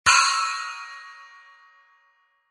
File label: 6in Splash, cymbal